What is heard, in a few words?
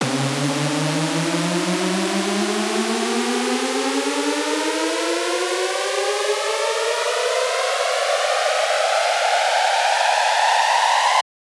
Car Crash; fx; base pack